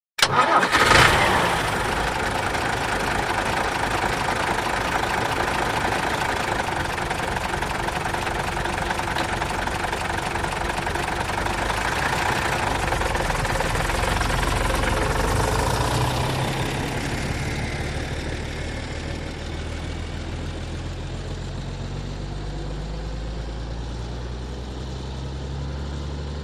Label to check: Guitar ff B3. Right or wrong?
Wrong